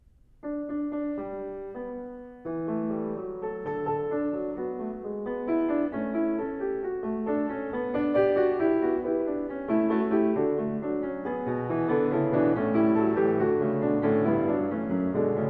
Prelude And Fugue No 5 In D Major BWV 874 Fuga, Clavier; Johann Sebastian Bach; Well-Tempered Clavier